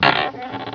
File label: creak; outlaws